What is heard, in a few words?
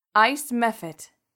ice mephit; bestiary; 5etools